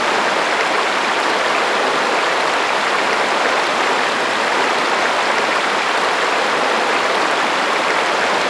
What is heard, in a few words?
Stream Lower Loop